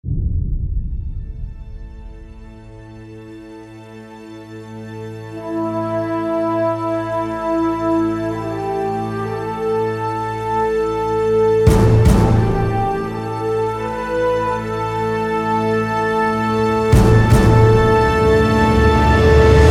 Epic Logo Intro

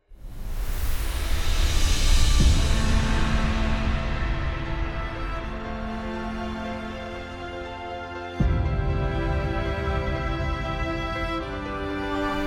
Cinematic Emotional Adventure

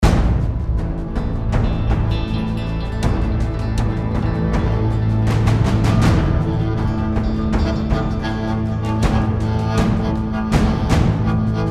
Epic Trailer

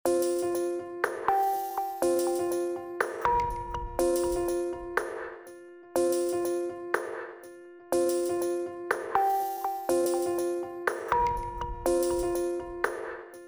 Corporate Technology Background

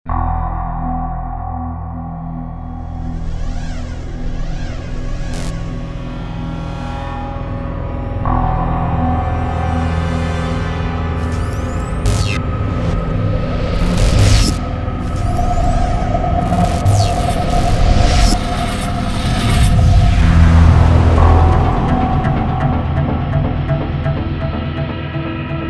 Futuristic Logo Intro

